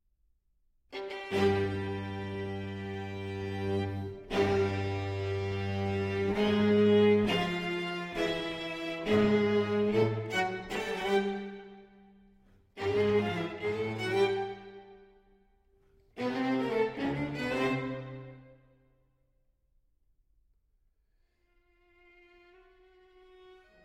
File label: Grosse Fuge in B-Flat Major